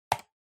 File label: PC Mouse L Hard